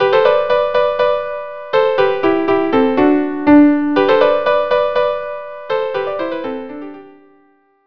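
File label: violin violin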